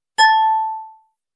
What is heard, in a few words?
DVD ding sound; disney